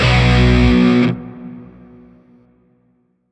Shawzin Chromatic Chord L; Warframe; Grineer Shawzin

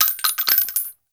Pistol Shell Droppings; weapons; Warframe; Grineer; Orokin

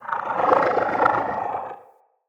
Corrupted Slug Vocal Idle

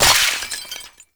Loot Crate Burst; Ambience; Gameplay; Entrati; Warframe; Infested Eris

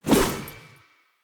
Long Sword Throw; Weapons; Player; Pothia Long Sword; Swords

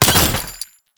Ammo Bounce; Ammo Ability; Warframe; Warframes; Frumentarius

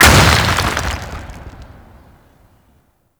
Fortress Asteroid Explosion; Ambience; Gameplay; Warframe; Grineer

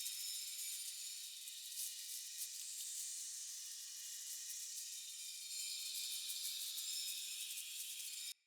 Talisman Grace Butterfly Loop; Cosmetics; Talismans; Founders Talisman

